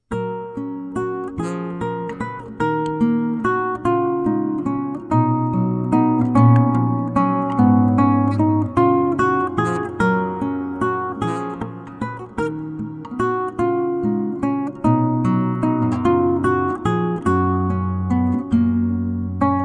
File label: duet in harmony